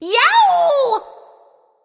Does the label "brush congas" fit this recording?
No